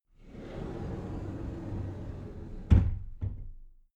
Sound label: cupboard slide door close soft